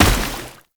Heavy Step Water, Footsteps; Warframe; Tau Dax

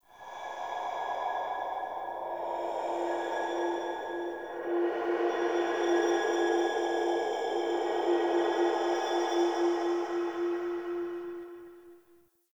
Cave Shrine Spirit Bells, ambience; Midrath Island

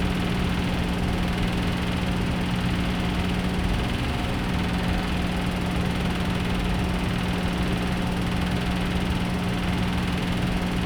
Vending Machine Hum Loop; Ambience; Props; Warframe; NineteenNinetyNine

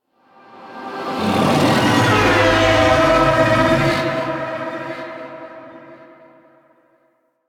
Vocal Patrol, Enemies; Warframe; Teralyst; DE

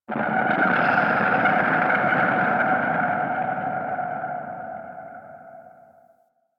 Exterior Deep Space Booms, Ambience; Warframe; Railjack

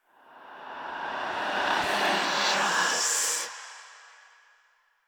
Whispers; Cave; Ambience; Orokin; Warframe; Stalker Cave

